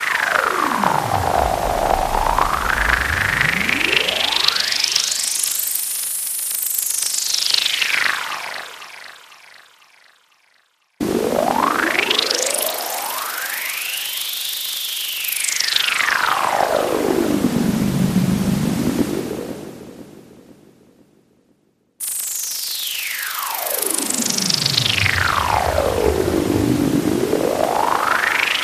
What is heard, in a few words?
fly a spaceship